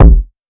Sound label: BIG HOLE KICK